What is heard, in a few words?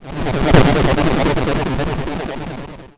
defeat; scrapeboard